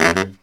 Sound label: Killer Brass Riffs, BARYT; USB Soundscan vol.29 - Killer Brass Riffs; AKAI